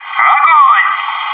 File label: "whoo"; maxpack